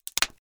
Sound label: Branch Crack